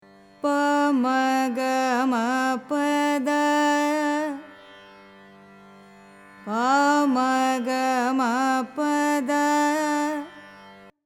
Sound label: mayamalavagowlai; carnatic music